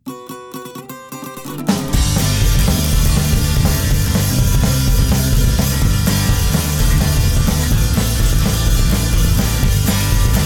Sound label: Player Piano Acoustic; Proteus; riding the rim